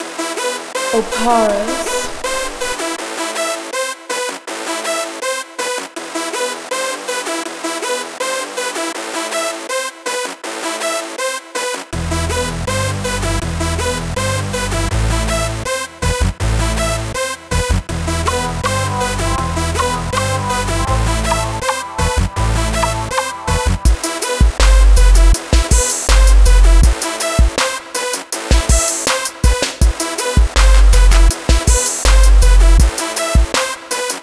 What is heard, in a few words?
80.5BPM